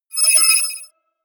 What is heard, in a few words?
computer sound, signale; soundpool